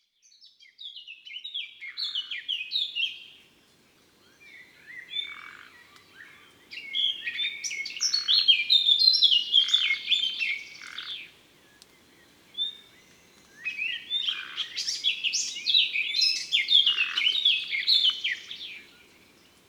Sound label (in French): Fauvette A Tete Noire; Ardeche; Concerts Naturels De l'Ardeche